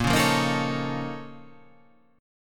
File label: A sharp Minor Major 11th, down